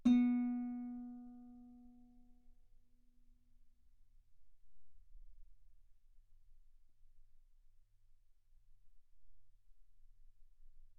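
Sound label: Guitar ff B string B3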